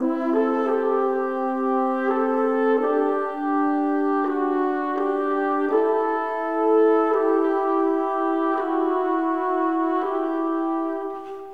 horns, Sad

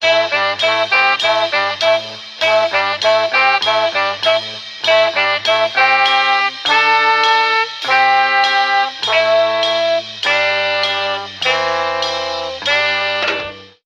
horns, phonky loops

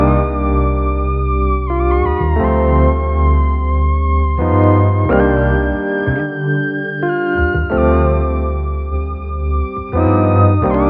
jeeh fonk, Loops Electronic

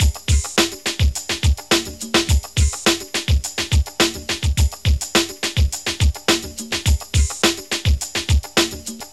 distant ratle, Drum loops Funky

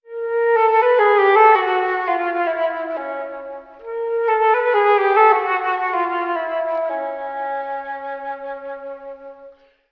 flute, Loops, Sad

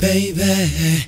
"baby" male SUNG

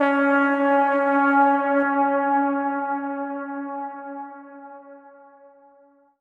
rive, Horns; Notes

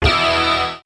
semicrunch, Guitar; Notes

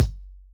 Kicks Soft

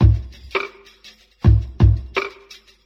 knock n shake, drum loops

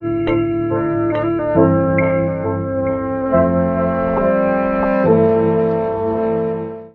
keys, sad loops

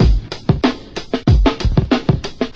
Drum loops, Boombap

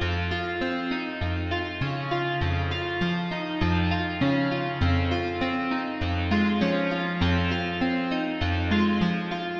piano, sad

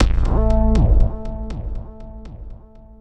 slider, synths; Notes

